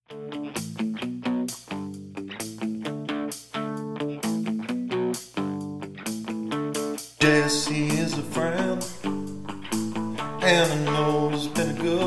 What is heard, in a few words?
Cover Band; Omaha